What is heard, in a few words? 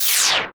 Ray Arcane